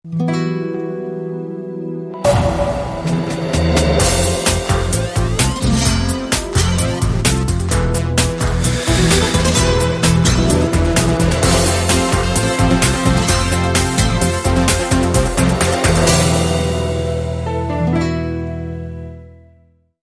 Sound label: Flamenco Walk